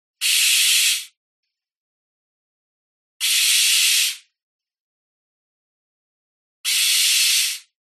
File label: animal bird owl barn squawks